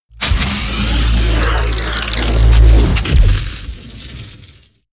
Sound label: wind up